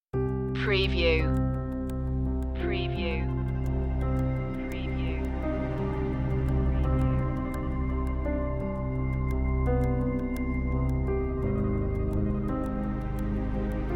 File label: countdown